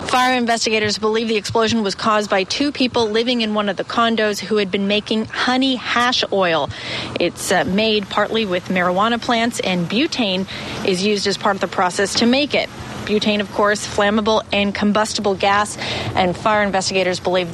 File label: explosion in concord